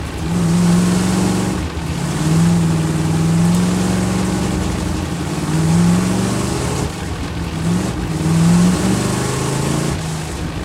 boss fight truck engine loop

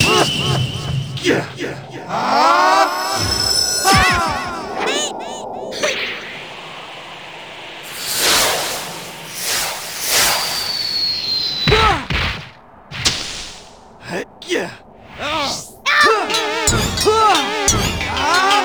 Boss Defeat; Vapor; Heroki

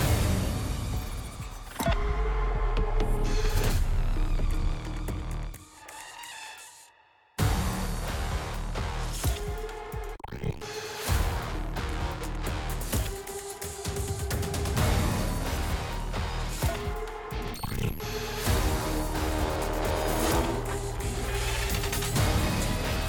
Battle; Kandialu 02

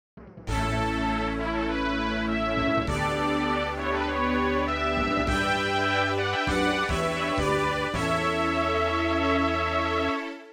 MIDI Fanfare; Deadly Sin

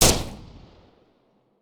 Rifle Shot; blastmorph pinball; xbox series xs

